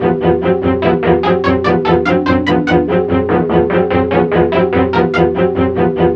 Car Game Music Loop; Spookware

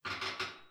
Creeking Bed; Welcome to the Game II

